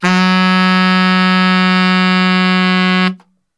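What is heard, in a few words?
F# 2X SAX STR, saxophone; Best Service; Brass Super Section; SAX STRGHT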